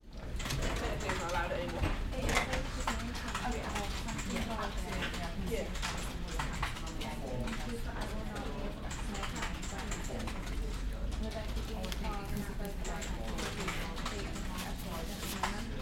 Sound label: ambiance